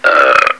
burp

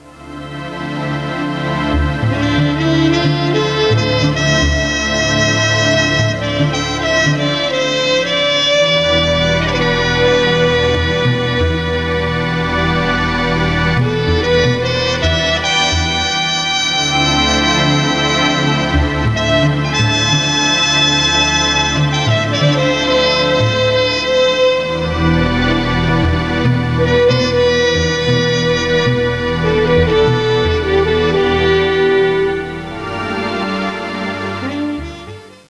night sax

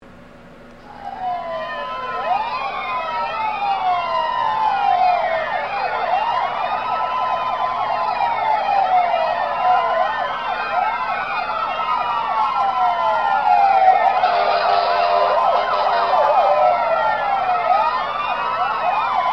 Sirens

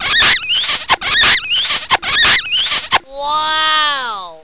kiss wow

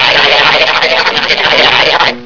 Ayeyeyay

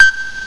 Newton Xylo

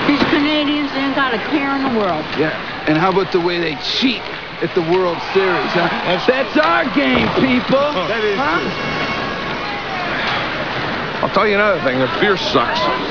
beer suck

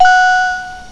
Newton Bell

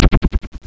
red small explosion

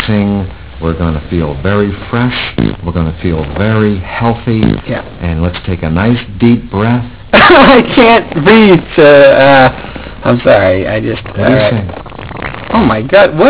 fart

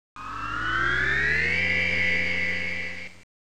Warp Up

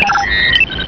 Computer Twerk; STNG

